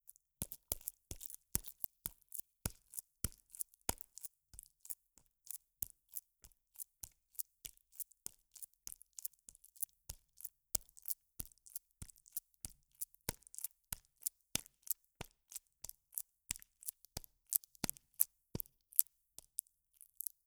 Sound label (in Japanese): ぱんぱん